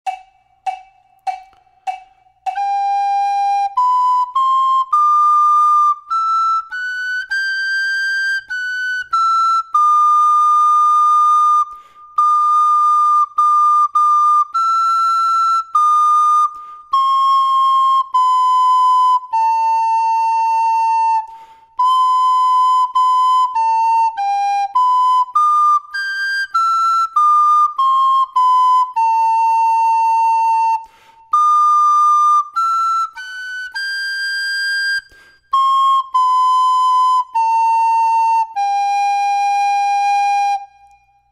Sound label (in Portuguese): PIANO FLAUTA SOLO; Duke Street